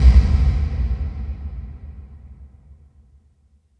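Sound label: Quad bass drum hall; quadraverb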